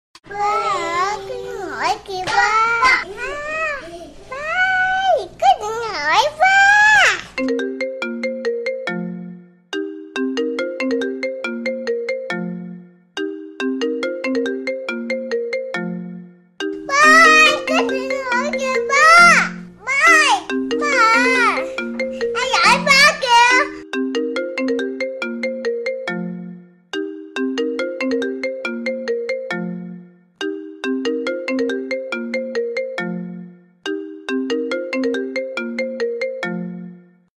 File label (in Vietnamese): tieng em be noi